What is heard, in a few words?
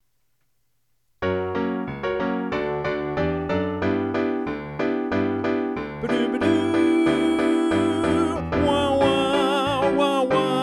Bass